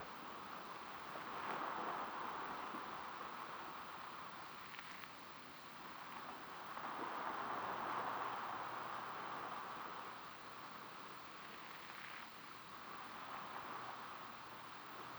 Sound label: Beach Light, Water